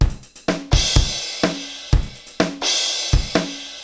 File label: Akai XE8 (125BPM), beats; musicradar; cyborg beats